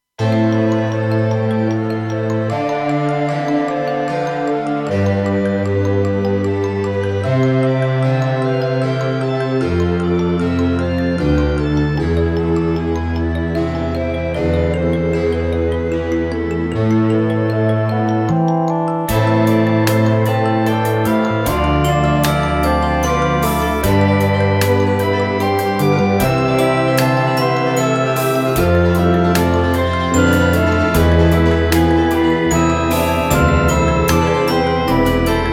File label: Menuett